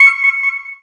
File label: scan